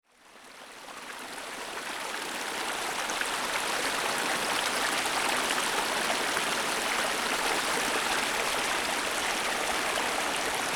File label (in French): Ruisseau Remontee